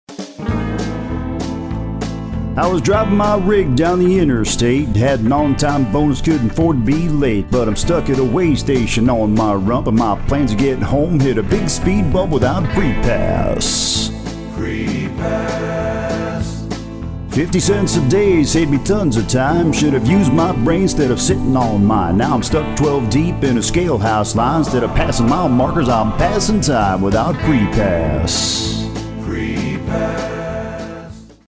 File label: Singing Commercial